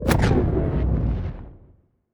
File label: titan muzzle energy explosion, weapons; kaiju; Stellaris; biogenesis